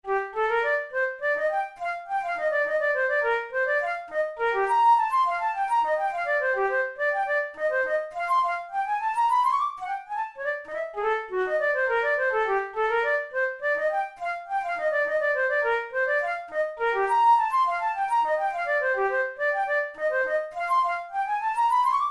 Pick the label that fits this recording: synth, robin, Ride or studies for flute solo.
studies for flute solo